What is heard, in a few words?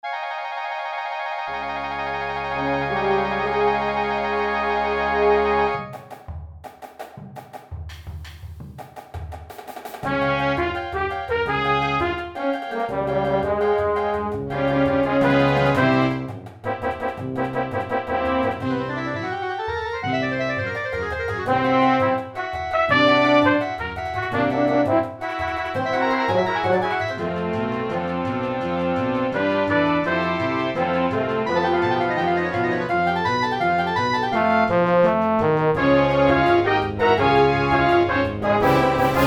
Cartoon